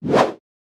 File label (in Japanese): 剣を振る; 武器効果音